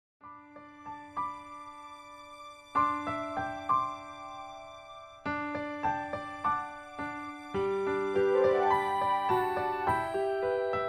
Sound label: chorus original key